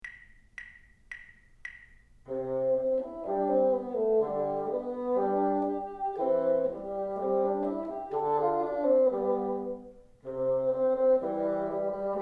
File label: together, bassoon